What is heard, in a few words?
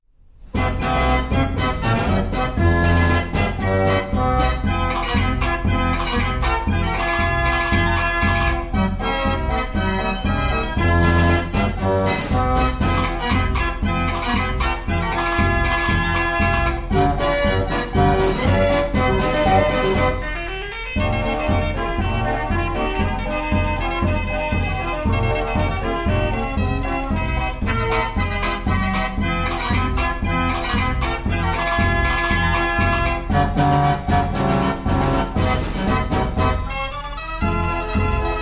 Rosita Paso Doble, draaiorgel; scala